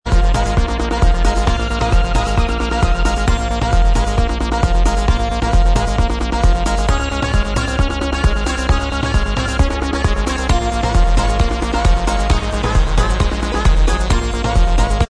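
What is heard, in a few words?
instrumental, backing tracks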